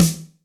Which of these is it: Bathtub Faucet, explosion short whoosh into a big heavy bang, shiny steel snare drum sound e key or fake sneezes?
shiny steel snare drum sound e key